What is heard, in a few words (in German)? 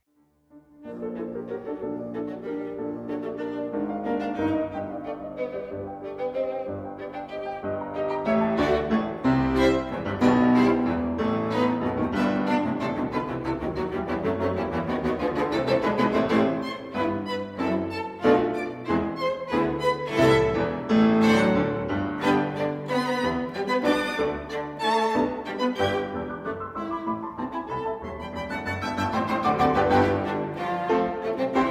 Klavierquintett; Minguet